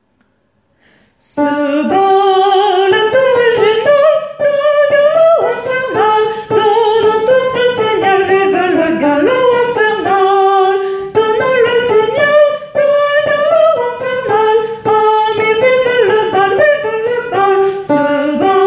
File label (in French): alto